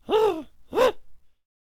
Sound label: Attack Vocals; Void Cultist